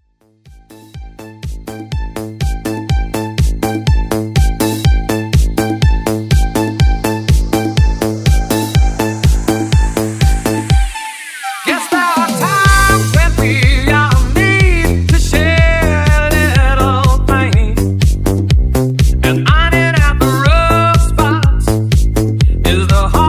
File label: Funky House